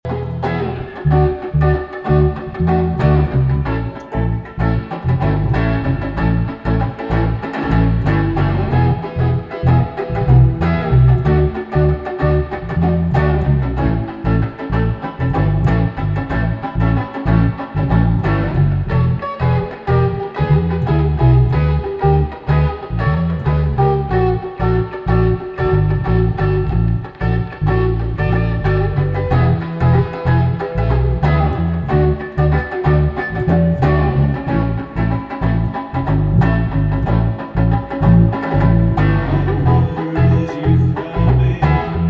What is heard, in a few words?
Short Riff